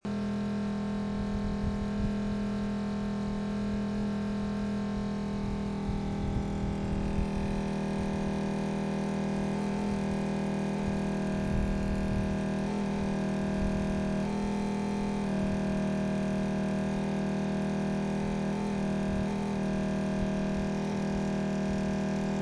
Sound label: water pump; at southbase